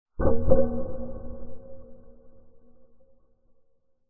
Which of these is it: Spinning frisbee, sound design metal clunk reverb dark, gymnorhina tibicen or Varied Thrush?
sound design metal clunk reverb dark